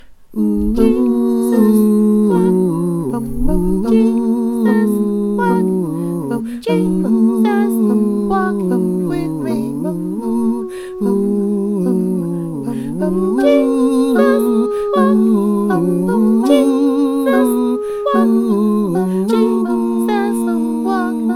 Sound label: TENOR